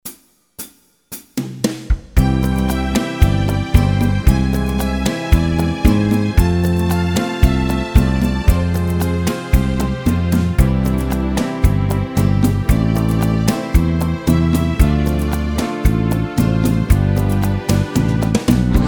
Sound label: BEGUINE, accordion